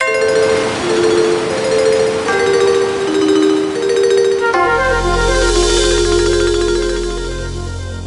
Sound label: chapter jingle; Monkey Madness